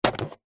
foley knife serrated stab into empty plastic milk bottle